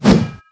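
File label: Attack One Mace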